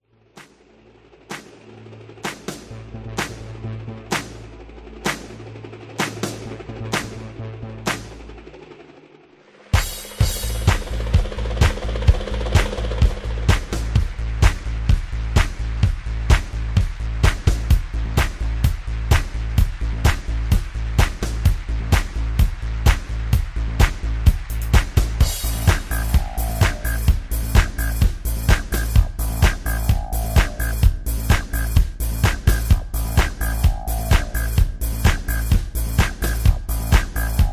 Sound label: Patter